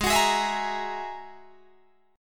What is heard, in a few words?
Ab 7th Suspended 2nd Sharp 5th, Guitar, Drop D